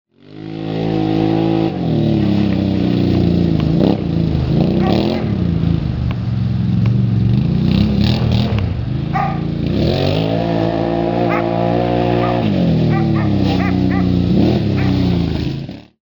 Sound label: outlander 800